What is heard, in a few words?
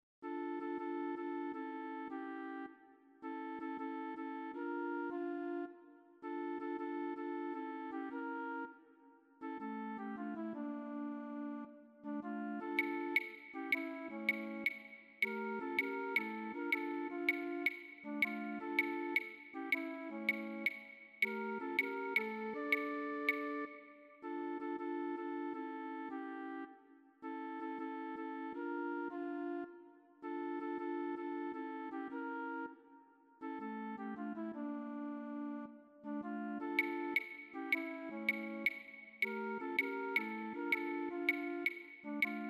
PERC; 7º-8º Música Primavera, 7º-8º Música Verano